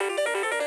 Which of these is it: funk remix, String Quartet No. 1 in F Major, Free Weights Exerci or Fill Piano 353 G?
Fill Piano 353 G